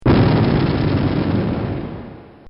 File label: Mortar Cannon Explosion; Guns; Bobby Cole